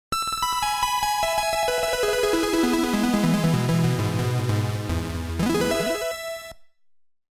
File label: Harp; the legend of xanadu original sound track